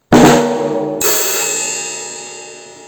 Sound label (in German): Schlagzeug Bumper; earsinning hoeren 2021; stadtschule michelstadt